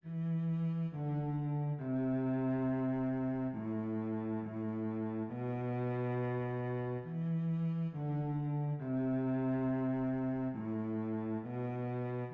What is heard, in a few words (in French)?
VIOLONCELLE